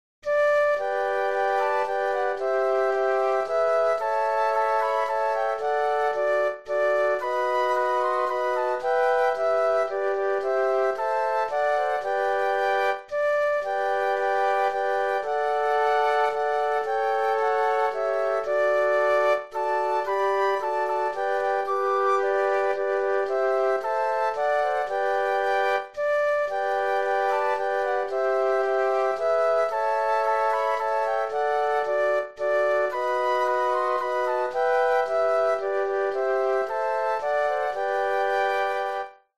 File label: Flute Quartet; christmas